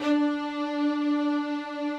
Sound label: strings